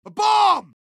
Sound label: abomb